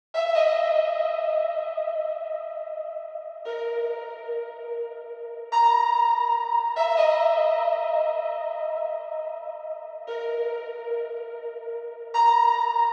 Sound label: pad, 145 BPM G# Min; Tantra